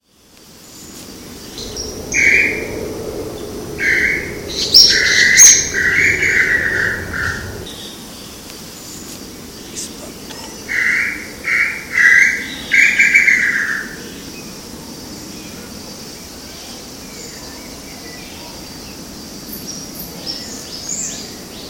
Crypturellus tataupa; PE Cantareira; SP

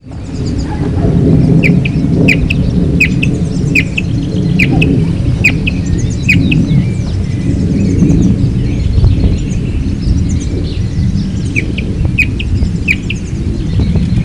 Formicivora paludicola; Guararema SP

